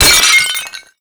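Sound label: destruction pot break; Explosions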